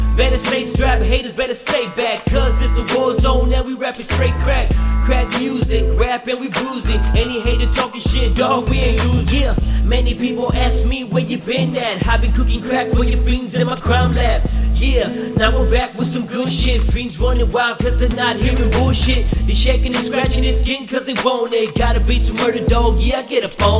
skulls crack music, hip hop ringtones

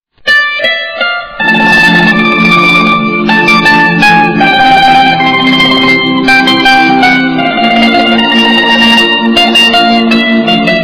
romantik, ringtones

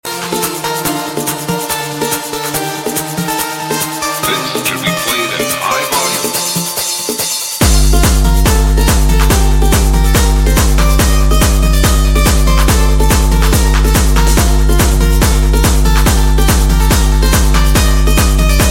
high volume ring

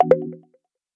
failure notification

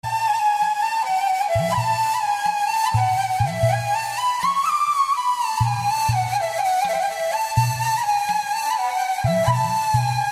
Ney Huzur

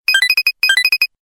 i phone msg

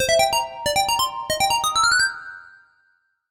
8-bit Game Sound

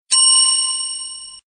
Short tone

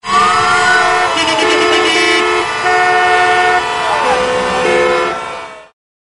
Lots of Traffic

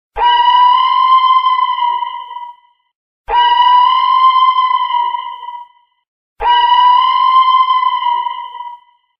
alien movie siren alert

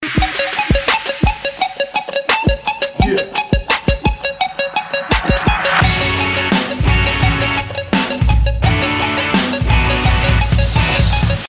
Melodic Guitar Hooks

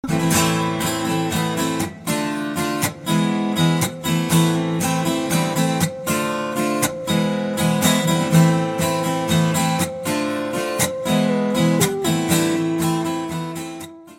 tango

